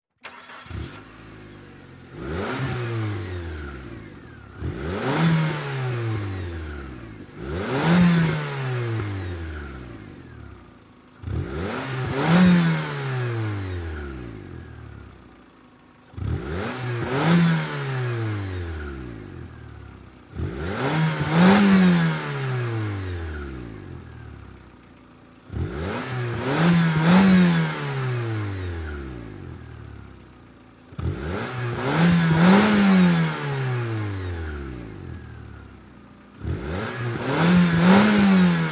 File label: Sm Car Eng Rev Off, compact